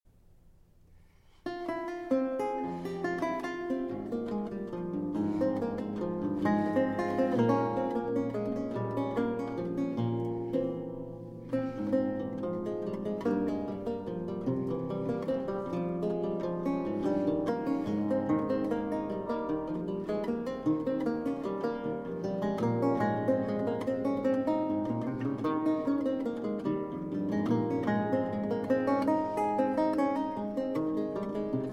Sonata No. 48 in F-Sharp Minor II. Courante

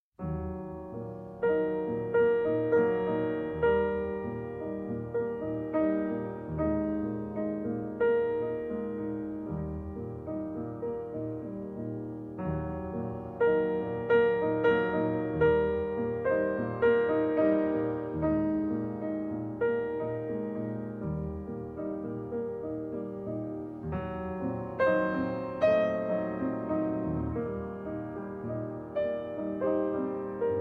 Cello Sonata in G Minor, Andante; Op. 19

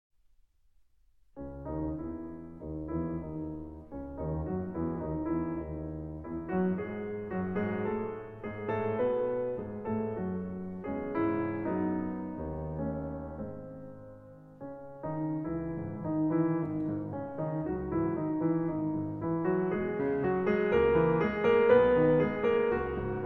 Intermezzi in C-Sharp Minor, Andante con moto; Op. 117

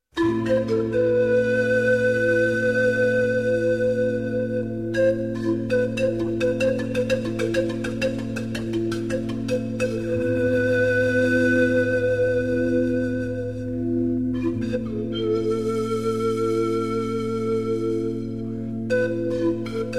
Pan Flute

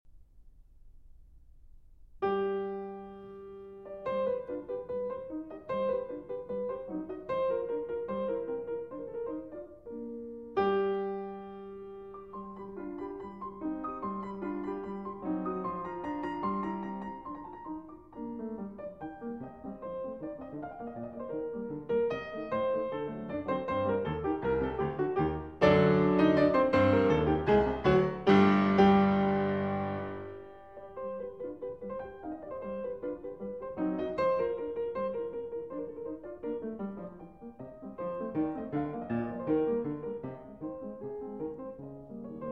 Piano Sonata No. 21 in B-Flat Major; D. 960; Schubert

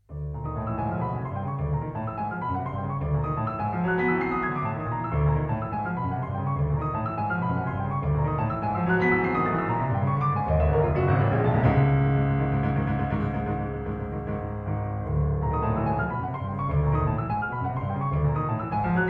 Piano Sonata, Presto Misterioso

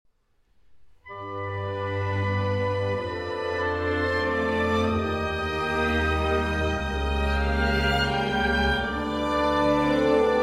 Trumpet and Orchestra; Arr. Lehn For

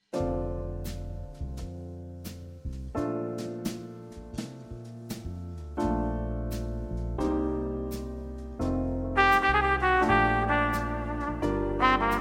Romantic Guitar

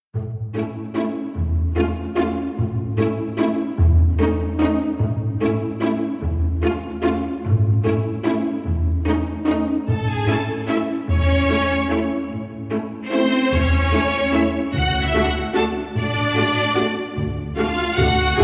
Strings Only

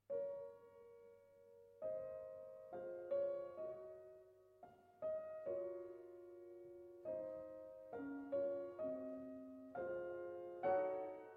Trumpet & Piano; Trumpet Concerto in C Minor, Op. 113 Poème; II. Molto Sostenuto

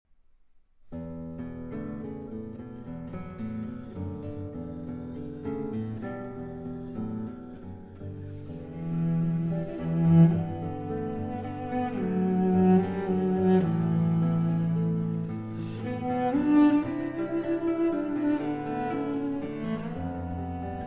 Scarborough Fair, Cello and Guitar; Traditional

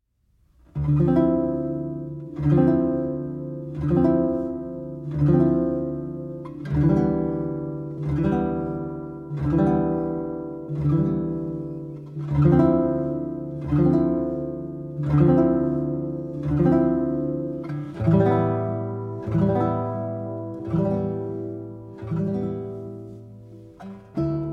Suite III. Movement (Arr. For Guitar)

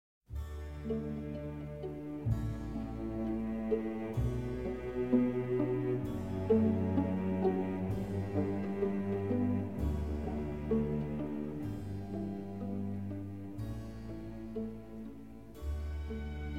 Canon & Gigue in D Major

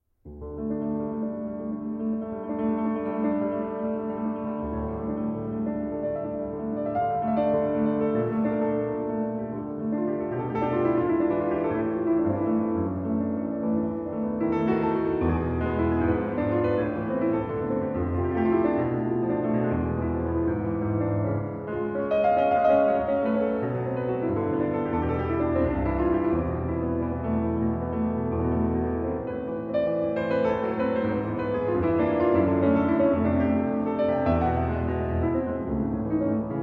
Arpeggio

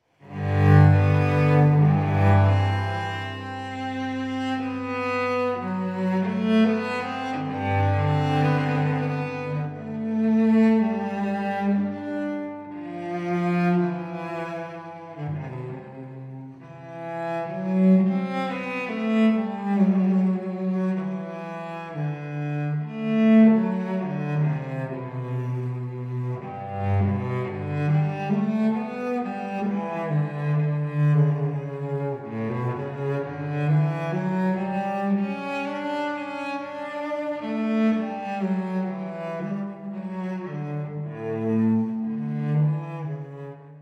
Suite in G Major, Sarabande; Suite No. 1; BWV 1007

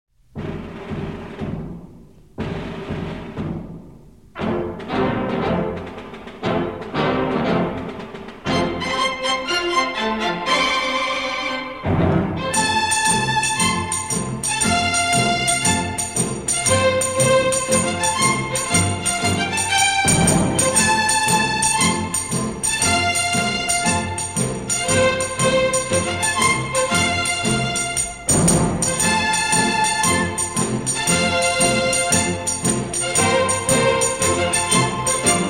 Orchestra Marching Strings

